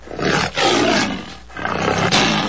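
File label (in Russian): рычание дикой кошки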